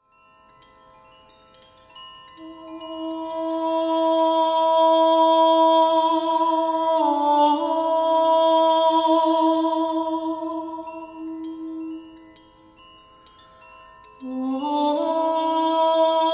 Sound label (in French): Voix et carillon en la